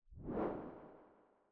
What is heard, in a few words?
woosh